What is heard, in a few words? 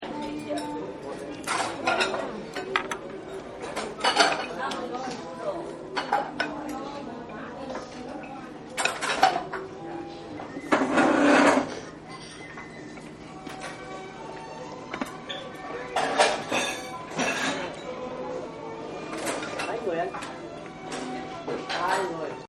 Chinese Dining; Holidays And Parties; Voice